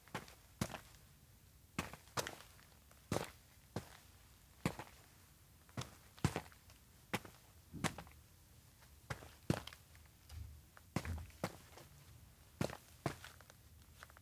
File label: steps outdoor town walk slow 2 loop; rikka; anemoi